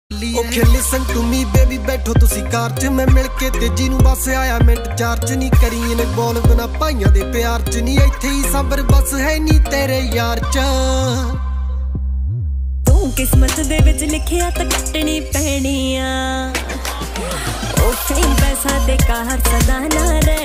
Desi Punjabi Ringtone

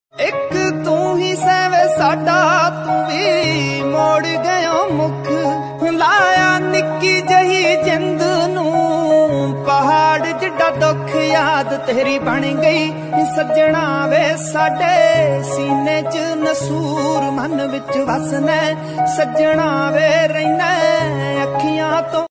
Trending Punjabi Ringtone

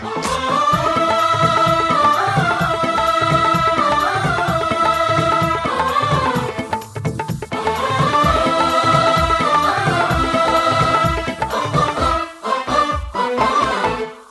BG Humming Telugu Ringtone

